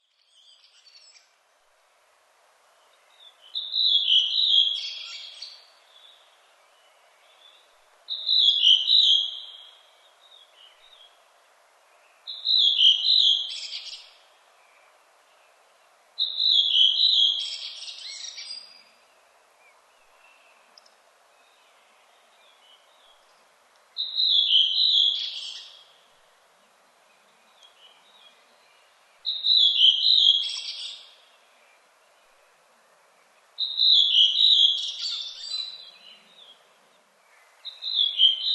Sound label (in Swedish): Rodvingetrast